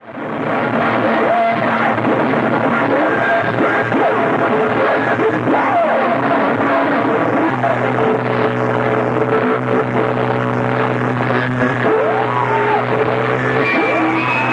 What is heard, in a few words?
Noise Room